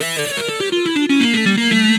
Egypto Riff, Keyboards

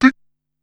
Ti, Korg Wavestation-SR, Single Hits

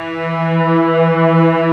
Textural Strings

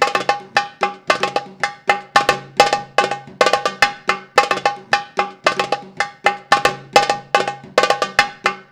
PERLP, ArabianTraditions

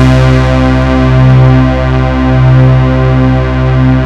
Monopoly

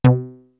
STACK BASS; East West - Global Sounds; PROC.BASS

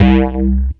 106 Bass C, One Shot Bass

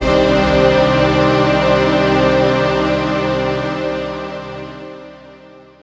Cold, Pads; Zero-G; Total Drum Bass

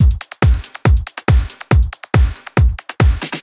Kick Loop, 140bpm

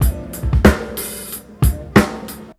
loop, Hip-Hop Street Beats; Sonic Foundry; Hip-Hop Street Beats Construction Kit; Street Beats by Poogie Bell

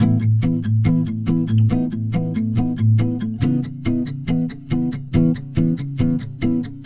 Acoustic Pop Guitar 140BPM C#min; Vengeance - Essential Clubsounds Vol.4

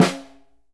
SNR, Synclavier Percussion 2 - World & Orchestral

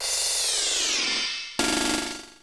Essential beats

